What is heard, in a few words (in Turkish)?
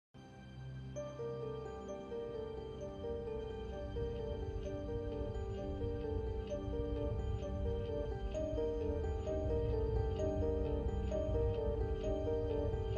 Enstrümantal fon müzik; Kadın